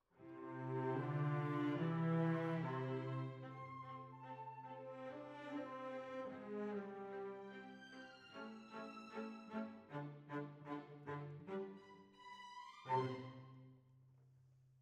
Cellos

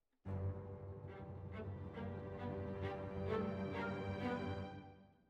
Violas Over

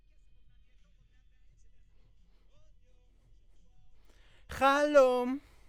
B. Vox

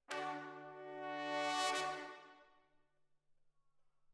ORTF, Jazz for piano

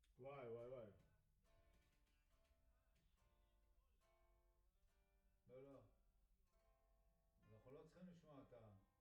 Piano A

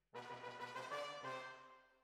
Horns

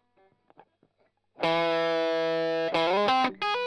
Strum Line

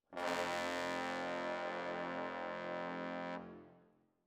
Brass Over

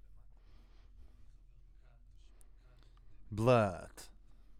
Voc OD

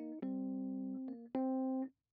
Rhodes

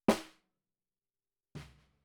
SNR Bot

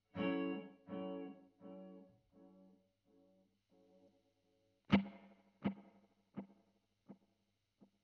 GTR Royer

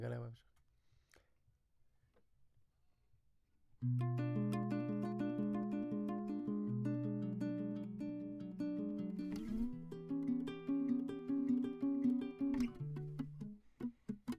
Classic Guitar

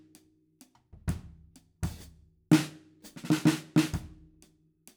Ride

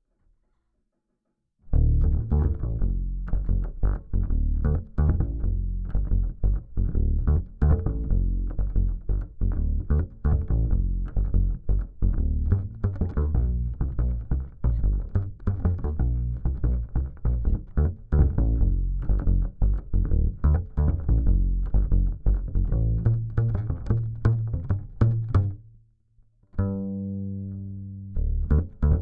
Bass DI

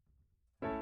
Piano A

